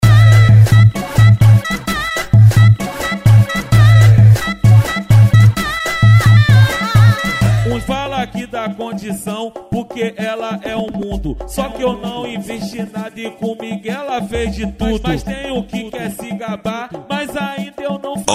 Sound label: funk bass; oz loudspeakers